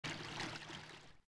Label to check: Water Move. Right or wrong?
Right